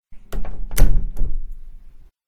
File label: door close; Sound design for the opera composer: concepts and methods; Vicky and Albert audio cues